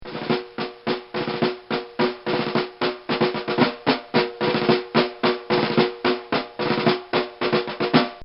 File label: Military March